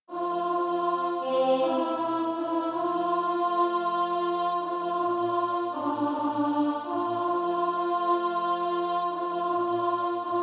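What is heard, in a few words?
alto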